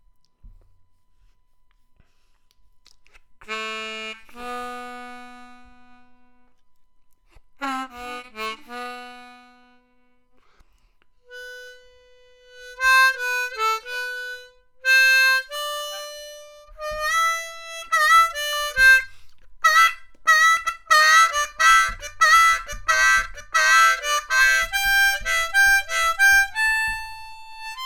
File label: HARMONICA (G)